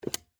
Monophone Up, Technology, Telephone, Monophone, Receiver, Pick, Up, Antique